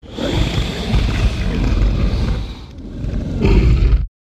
Monster Combine, monsters

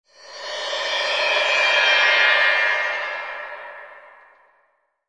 Music Eerie Twkl Pad

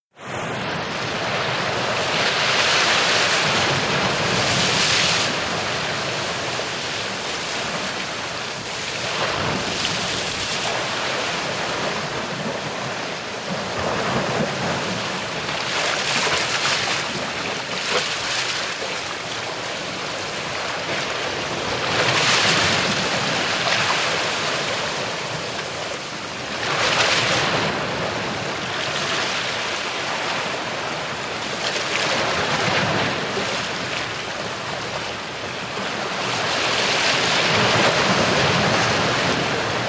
Ocean Liner Bow Wash R, ships

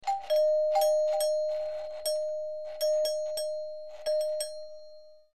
Single Tone Door Bell

